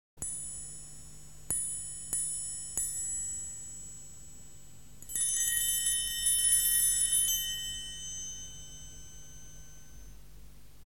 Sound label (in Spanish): Sonido de triangulo; Instrumentos de percusion; Percusion indeterminada; metal